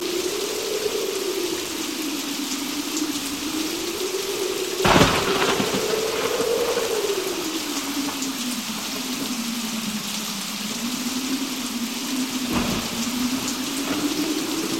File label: thunder storm rain